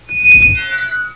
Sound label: feedback